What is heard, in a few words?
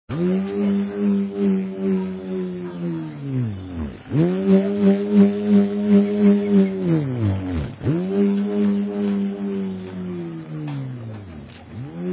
bullroarer